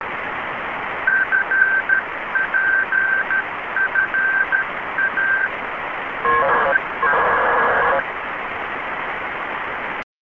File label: beacon